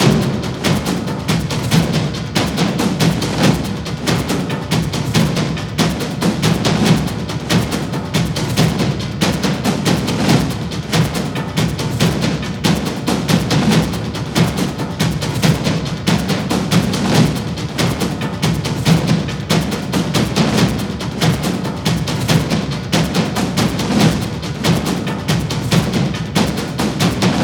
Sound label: Continous Loop; Valley